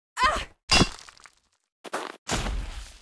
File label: Mob die; Monster; 북해빙궁; 금령주